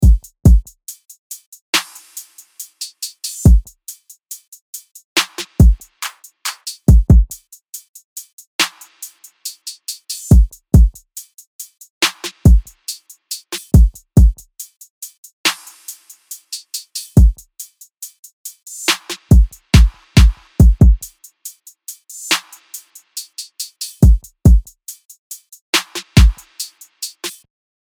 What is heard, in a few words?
Drum Loop; Pluto